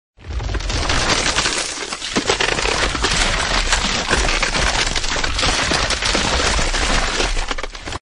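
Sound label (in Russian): ломается лед на реке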